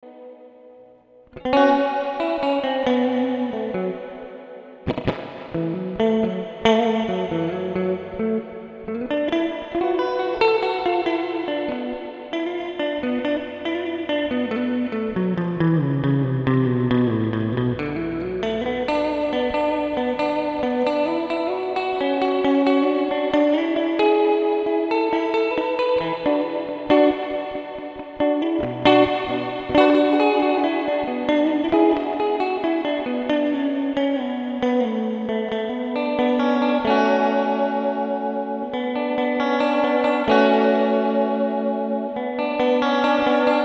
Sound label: Immerse MKII Spring